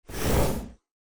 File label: Swoosh Transition